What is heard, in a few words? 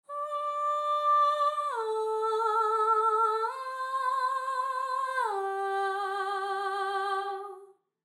Vocals Legato Aah